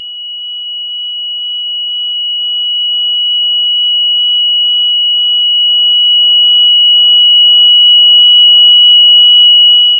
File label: mono, countdown